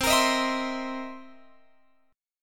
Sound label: C 7th Sharp 9th C Guitar Open F; C7#9 strummed